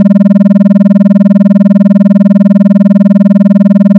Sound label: am out, AM Modulation; 200 Hz sinusoidal carrier with 10Hz triangle modulator; change modulator from 10 to 5